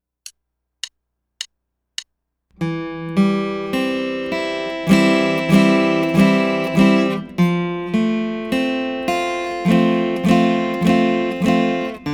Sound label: Four String Chord Study